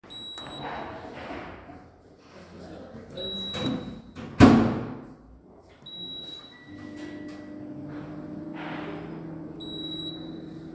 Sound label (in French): bip